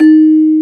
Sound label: CELESTA D#4; MALLETS